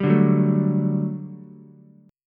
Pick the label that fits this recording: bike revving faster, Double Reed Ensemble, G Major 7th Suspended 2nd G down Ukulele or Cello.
G Major 7th Suspended 2nd G down Ukulele